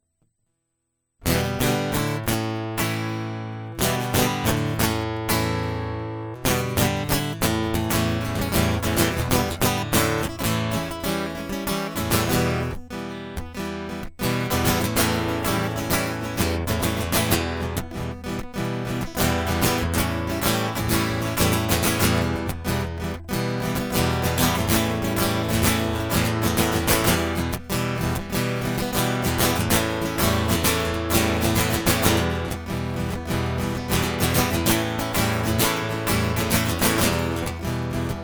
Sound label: Guitar Loop